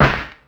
Loop Snare, Ensoniq ASR-X Pro

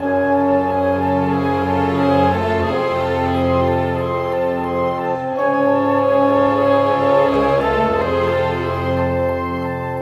Cinematic Orchestra

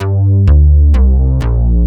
BAS, 2 Step

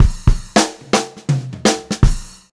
FUNKY FILL, Funk-Groove Drumloops; USB Soundscan vol.38; AKAI; 90 s Sample CDs; 120 FUNKY

